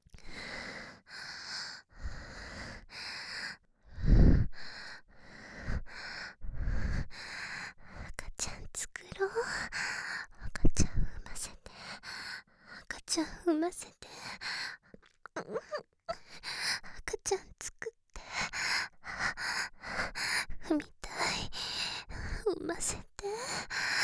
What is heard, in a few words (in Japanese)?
喘ぎ, 左, バイノーラル; 赤ちゃん作ろ♪; DL製作班; ハーレムオナサポ 4人のメイドがご主人様のオナニーをお手伝いするバイノーラル